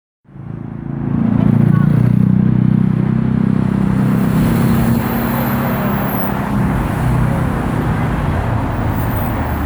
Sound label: vehicle